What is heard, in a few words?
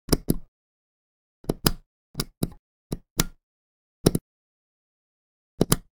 switches; NS Studios - ultra sound collection